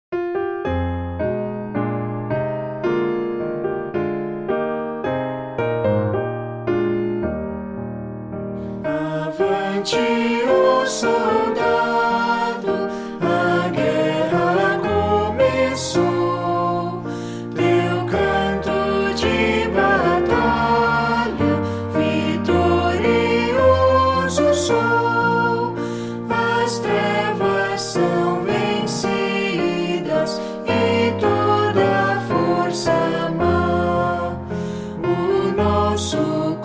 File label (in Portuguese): voz piano